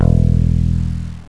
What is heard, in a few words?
BASS; sc2k